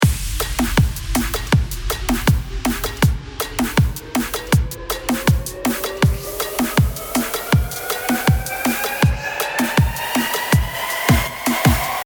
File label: Drop Build